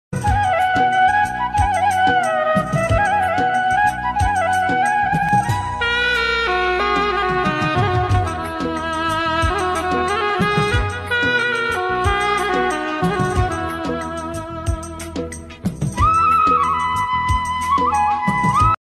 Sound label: ringtone flute ringtone love